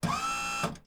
Electric Lift; 99Sounds; The Warehouse

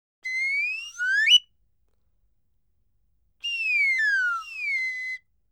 Swannee Whistle, Ascend And Desce; BBC Sound Effects Library

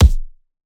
Punching Percussion, Straight Hand, processed; 99Sounds

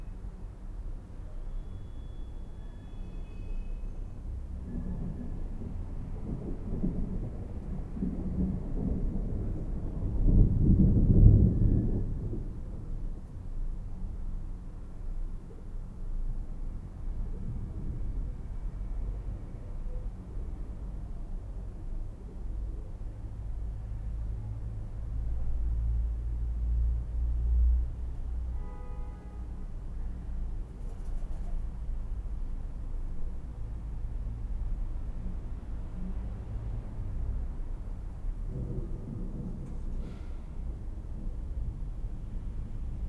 distant thunder; 99Sounds; Rain And Thunder

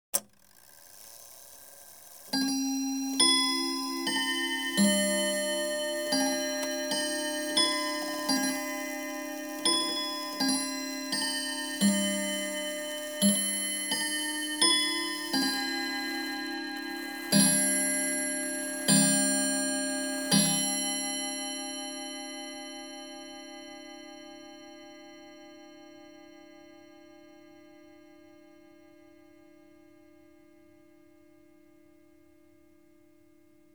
Domestic Chiming Clock, 3 O'clock; Household; BBC Sound Effects Library

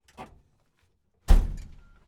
Volvo, 142, Rally, Door, Various, Interior

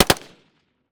Full Auto 223 Bursts Close; WatsonWu

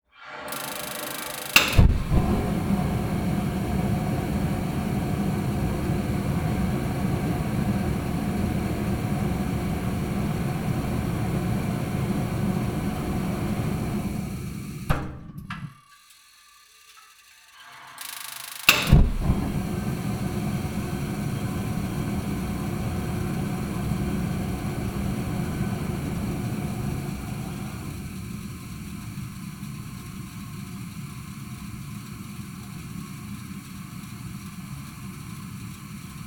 Water Heater; GainWalkers; Old Stuff

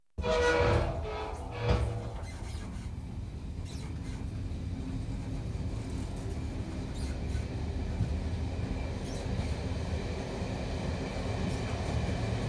Electric Trains Train starts; BBC Sound Effects Library

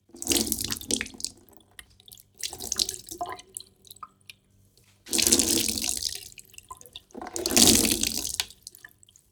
Sponge; TheWorkRoom Audio Post; Cloths & Sponges Foley

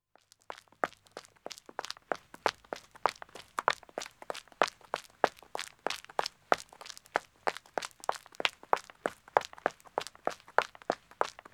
Country Road Running 1 woman; Footsteps; BBC Sound Effects Library

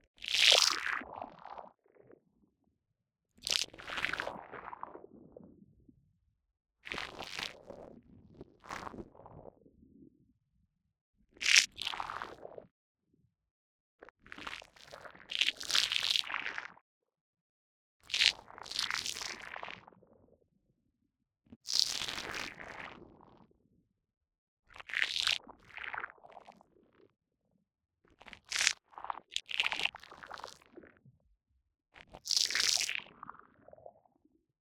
Mutation Small Creature Blood Flesh; MatiasMacSD

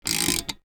crank, Clock

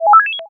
confirmation, Interface Sounds; Kenney's Sound Pack

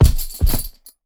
Punching Percussion, Bag Drop, raw; 99Sounds

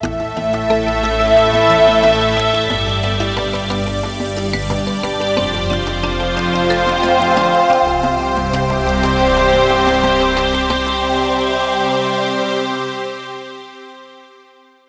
Signature Sound; FXHome; Signature Tracks

